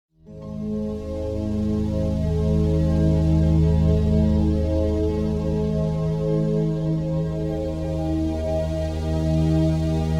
528 Hz Chakra Cleansing Meditation Relaxation Music; DNA Healing